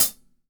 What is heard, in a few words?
Hi-Hat Closed Stereo; Sonic Foundry (Sony Creative Software); Vital Drums